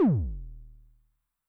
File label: BD MOD [PItch+Depth], 909mod, BASS DRUM; classic machines